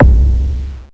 BD, Mutable Instruments Anushri

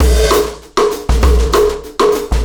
Beat, Processed Drumkits; Event Horizon

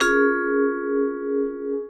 VIBES; Houseworx

